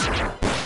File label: Attack Massacre Sword; DQIV iOS SFX